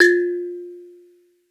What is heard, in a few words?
Bonang Para EQ, Gamelan